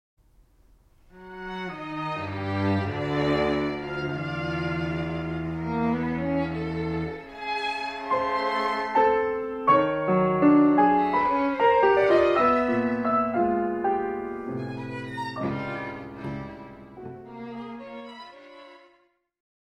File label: Piano Quintet